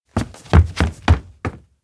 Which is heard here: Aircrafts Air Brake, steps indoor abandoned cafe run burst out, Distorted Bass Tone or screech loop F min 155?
steps indoor abandoned cafe run burst out